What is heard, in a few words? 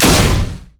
crit; LoL; lucian